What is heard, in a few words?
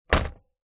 vehicles motorcycle helmet set down on wood table hard visor vibrate